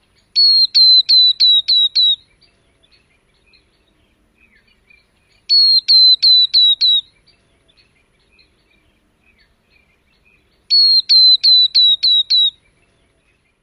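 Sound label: songs, northern beardless-tyrannulet; TX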